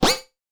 button

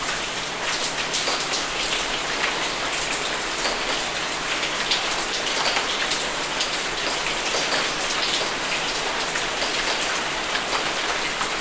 rain

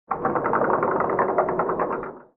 sliding door

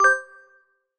button

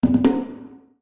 conga

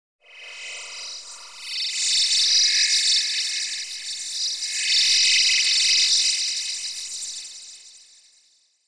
ufo

